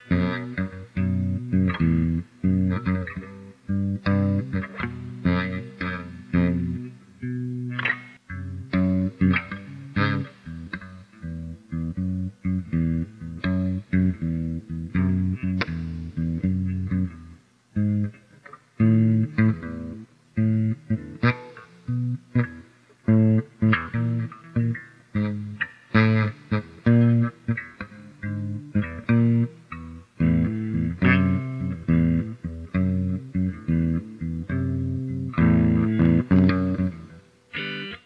guitar play music; gamerip; The Sims 2

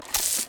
Water Splasher; Rabbids Multiverse

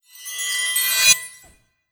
Elementaliste Spell

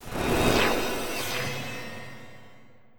Magnet

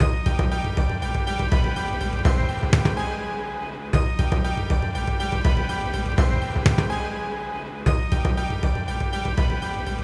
Battle Transition

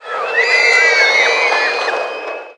Scream

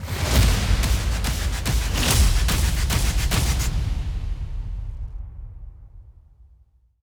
Music Ramp Up; Toronto

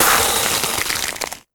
Ice Spell Freeze Frost

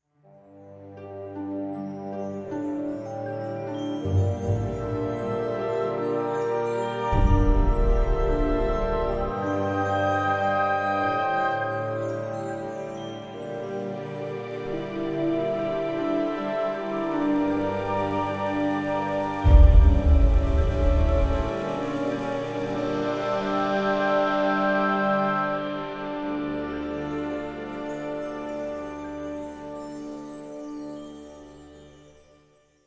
forest music

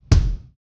bassdrum

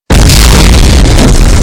Boom; Mars Sortie

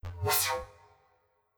Sceptre; Legend of Keepers (gamerip) (2019); Godess Sceptre M Wiz

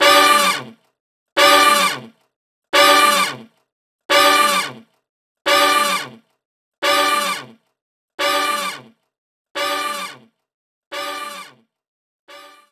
Basketball Hoops; Sfx Basketball Hoops

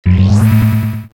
Bubble shield; The Henry Stickmin Collection

